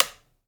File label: BMW 1150gs Kill Switch